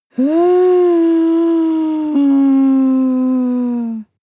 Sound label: female moan whine soft; soundpool